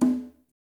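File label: conga, right; Spectrasonics; Liquid Grooves 1 & 2; CONGAS+BGOS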